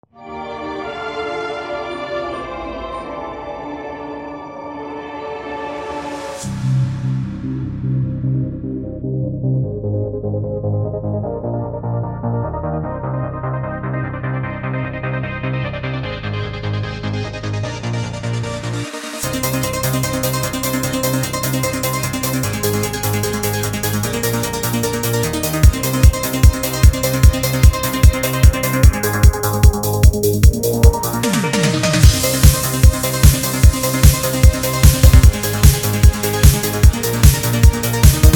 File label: METROID MUSIC